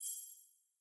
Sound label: button click; bloodsuckers2